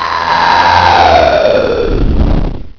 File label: Godzilla